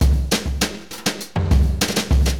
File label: Drums; Acid Jazz Funk